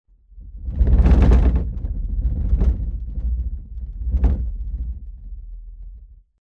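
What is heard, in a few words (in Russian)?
мебель дома; Звук землетрясения в доме; Звук землетрясения в доме, когда трясется мебель, падает посуда с полок, звуки скрипа, гул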